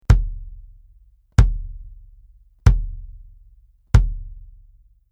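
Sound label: BASS Bury Beater, bass drum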